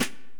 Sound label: Korg x5, Drums